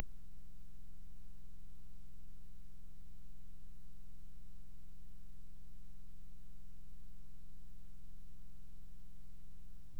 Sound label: noise